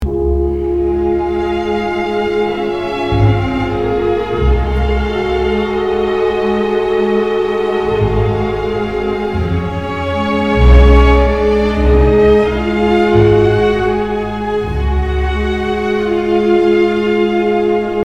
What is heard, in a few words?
Lyrical Transition Section; 4th movement; symphony1